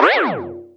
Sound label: wrong move